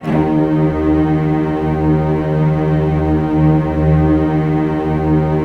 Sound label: DOUBLE BASS